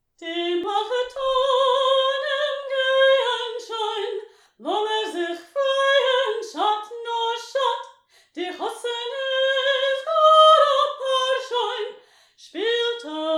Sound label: makhetonim practice version soprano, chorus